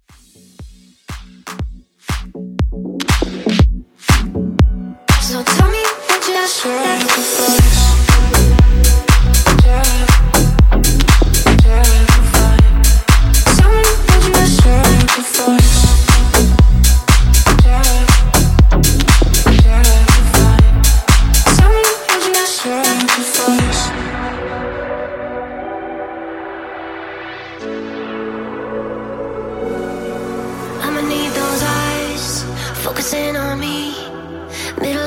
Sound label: Top40 120 Bpm